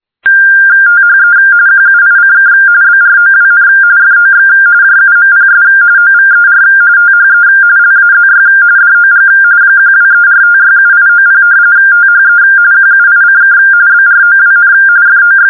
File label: RTTY 170Hz; 45point45